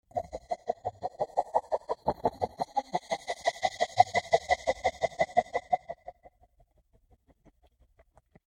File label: Alien Machine Scanner Analyzer Warble Shimmer; Flange Bubble; Computers; BBFX